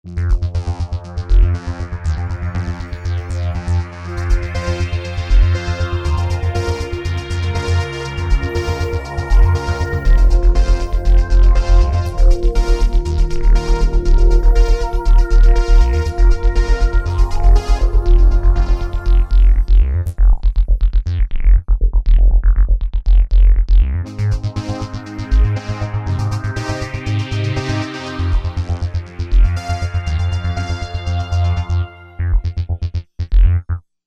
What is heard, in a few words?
Vocoder Mix, synth